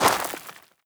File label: scuff, footsteps, gravel; player